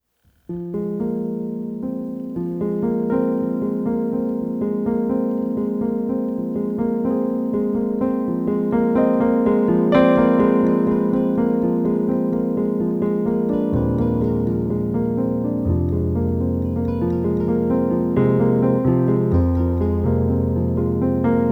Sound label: Bells In Caves